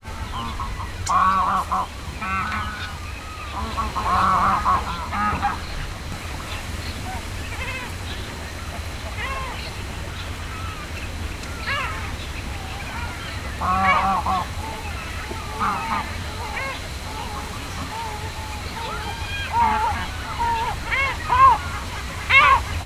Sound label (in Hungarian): hajnali felöt, halastavak; hortobágyi nemzeti park 2013